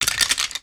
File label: Bones